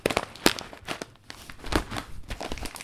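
cofee beans bag asmr, a small bag of coffee being opened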